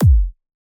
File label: Punchy kick